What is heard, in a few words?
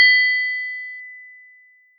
Complete Sound